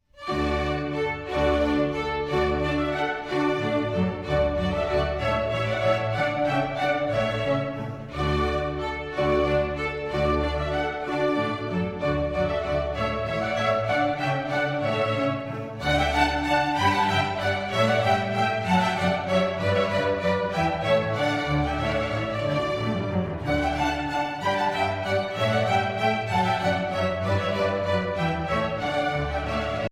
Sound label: Menuet; Handel